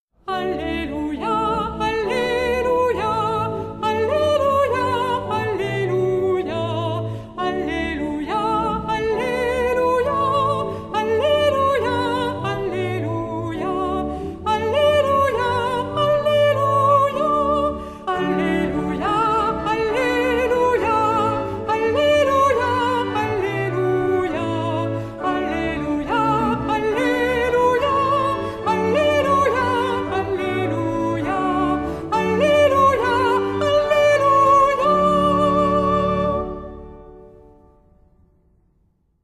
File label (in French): Alleluia, Chorale; Paques